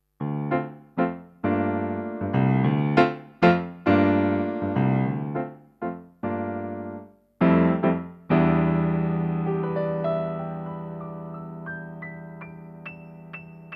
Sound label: P115 Bright Piano